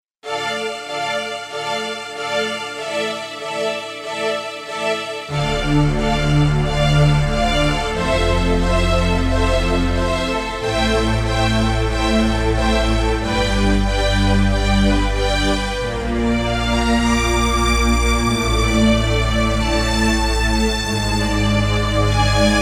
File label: JV Strings, Roland JV-880; Factory Patches